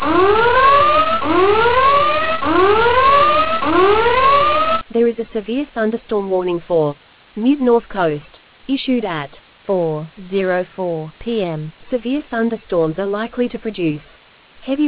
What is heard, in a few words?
storm audio